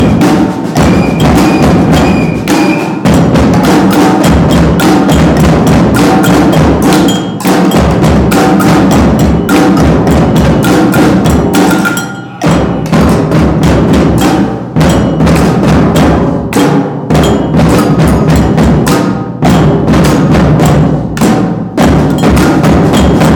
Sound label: funk, All with breaks, samba